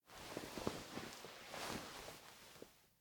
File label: Rustling Of Clothes; LOOPERS